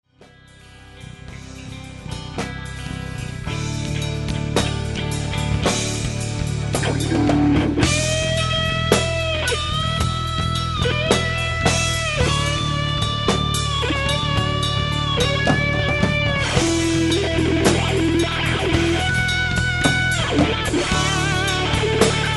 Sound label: time solo